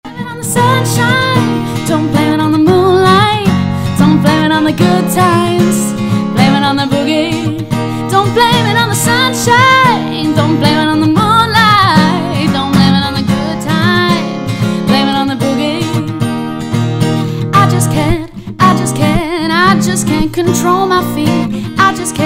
Acoustic

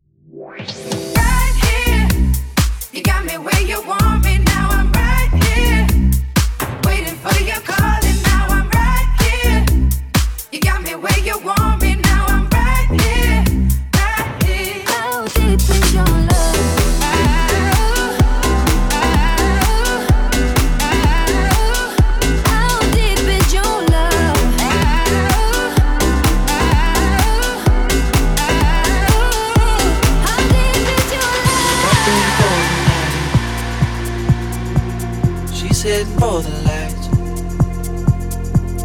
Deep House Mix

